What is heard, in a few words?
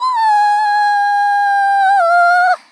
Yell; Teto; SM64 CO-OP; Triple Baka Pack